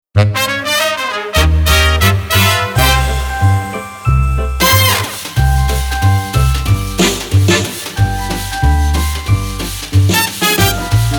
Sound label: Christmas Swing